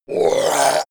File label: zombie grunt choke male short